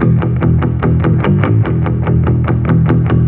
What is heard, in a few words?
bass, Loops Phonky